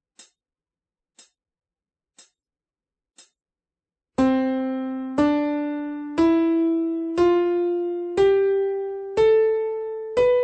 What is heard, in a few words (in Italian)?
Sincope es a